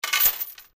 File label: Chain Impact Drop Chain; LYN103 package of Chain Sounds; Metal Sound Effects; LynneMusic